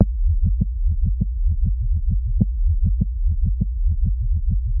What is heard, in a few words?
099 ultra wubbly bass